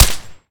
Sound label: suppressed pipe gun; Weapons; EK modern; Barotrauma